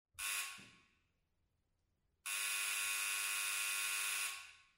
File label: Foley